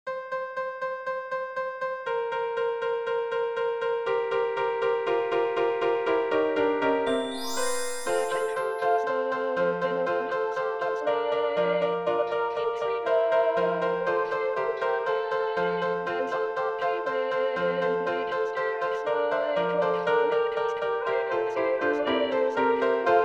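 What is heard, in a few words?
Jingle bells Bass; 2021 Xmas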